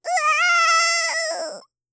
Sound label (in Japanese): うわぁあぁあ～ぅ, ダメージ